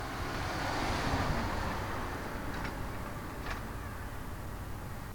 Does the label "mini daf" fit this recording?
No